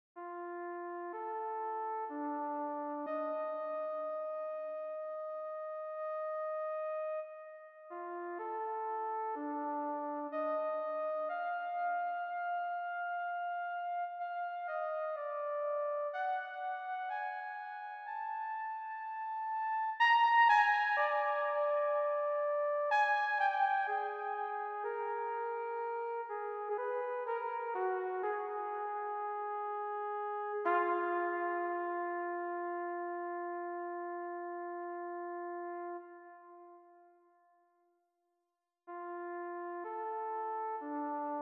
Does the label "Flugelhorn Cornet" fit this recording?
Yes